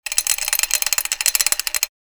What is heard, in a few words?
Chain pulling through mechanism